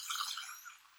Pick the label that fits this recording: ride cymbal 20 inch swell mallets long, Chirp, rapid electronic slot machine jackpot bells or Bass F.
Chirp